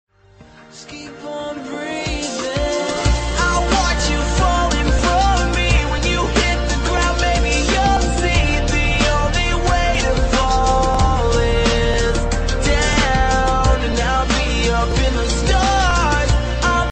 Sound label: pop ringtone